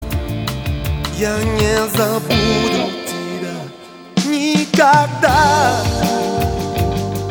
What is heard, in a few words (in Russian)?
песня